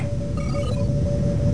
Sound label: bleep; os2